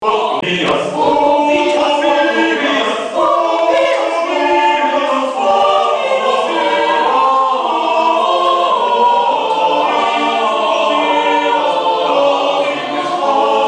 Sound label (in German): Dreistimmiger Kanon; auf die textword Oh Tobias Dominus Haslinger fur Tobias Haslinger